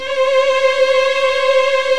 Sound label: VIO MIN TRIL